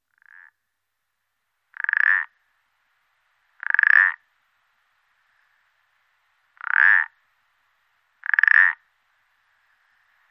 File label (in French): Pelodyte ponctue Pelodytes punctatus